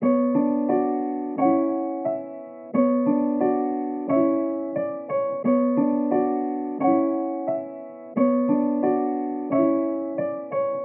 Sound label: 177 BPM B Min Piano; Loop Stems; Cymatics; 2022 Melody Collection Platinum Expansion - Pt 2; Origami